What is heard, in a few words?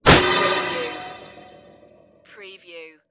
space bullet strike